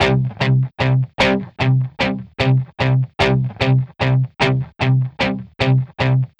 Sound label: Grit E-Guitar; Reggae (Регги)